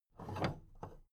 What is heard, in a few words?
big plastic case handle take